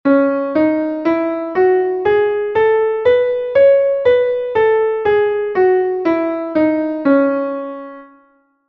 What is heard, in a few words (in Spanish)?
do sostenido menor natural